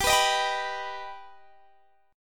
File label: G Augmented, Guitar, Open D; x,22,21,21,22,21; down